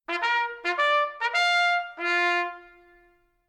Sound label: Branch Call, Central Band; CME; CF Central Band; heritage material; audio heritage